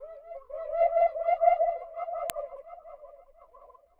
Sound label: ETHEREAL TEXTURES; Sonic Foundry (Sony Creative Software) - Future Retro Dance Excursions